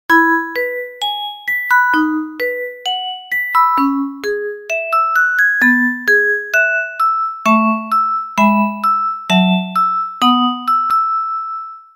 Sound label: musicbox